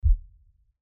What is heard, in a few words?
hover off